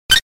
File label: toot